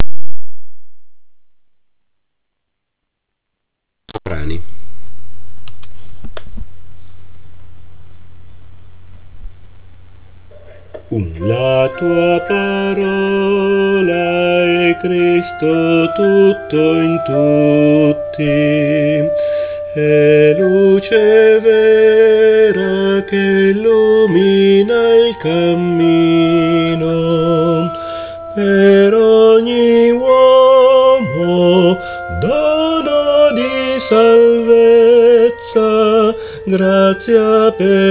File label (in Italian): C Soprani; ordinazioni presbiteriali